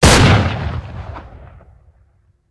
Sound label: 44 Mag, Guns Fire; Weapons; Civ